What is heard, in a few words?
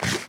eat; minecraft